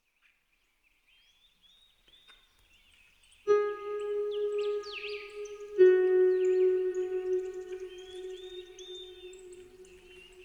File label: Relaxing Spa Music, Celtic Harp & Birds; 50 Mindful Sessions Turkish Massage Treatment